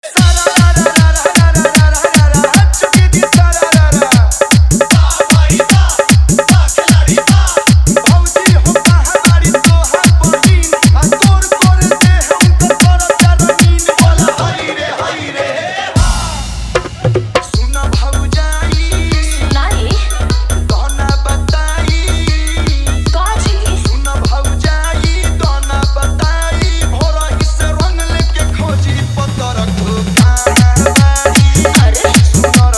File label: Tabla, Bhojpuri Holi Full Dhollki